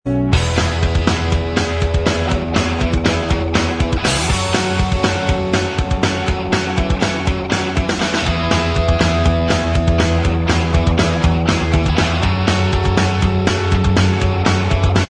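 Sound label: instrumental track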